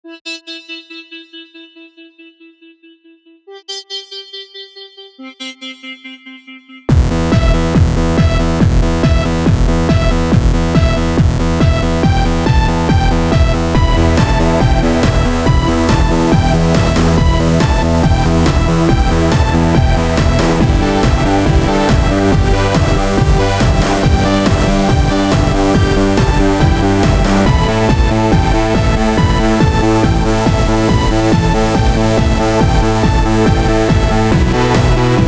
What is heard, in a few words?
techno pug ins